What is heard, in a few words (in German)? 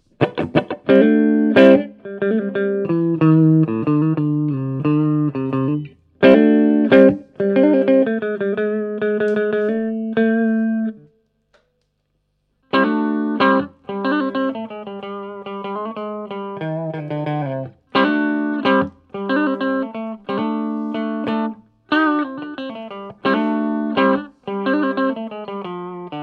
Standard HP Clean mmitte mit und ohne phasendreher